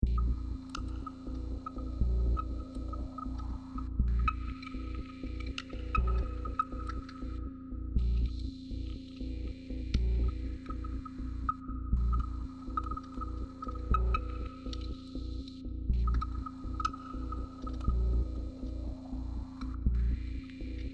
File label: rhythm